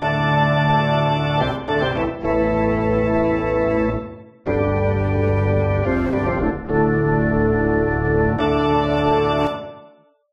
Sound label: Ditty; All Star Baseball 97 Featuring Frank Thomas; PS1; Saturn